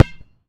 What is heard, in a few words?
Street Ball Bounce Dirt, Street Basketball; SFX Kit